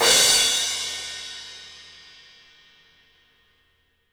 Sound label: CYMBALS; 3D Sound; 3D Drums